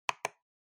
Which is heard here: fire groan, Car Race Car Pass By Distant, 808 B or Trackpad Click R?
Trackpad Click R